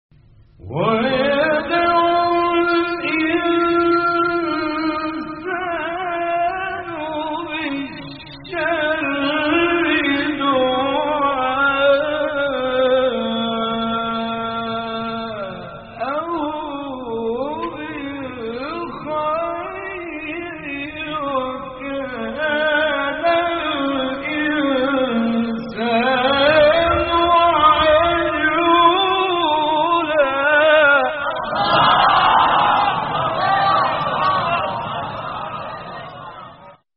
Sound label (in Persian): تلاوت زیبای آیه سوره مبارکه اسرا; آیه 11; استاد غلوش